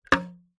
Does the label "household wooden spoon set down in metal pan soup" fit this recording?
Yes